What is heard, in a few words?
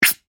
Hydraulics Short, Injection, Needle, Scifi; Science, Fiction